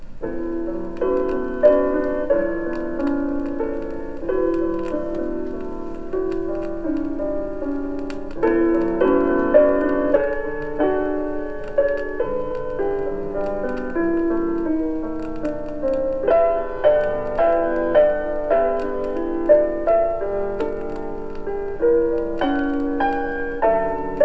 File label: waltz